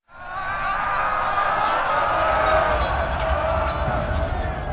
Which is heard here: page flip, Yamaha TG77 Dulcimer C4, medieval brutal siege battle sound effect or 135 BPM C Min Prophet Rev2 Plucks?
medieval brutal siege battle sound effect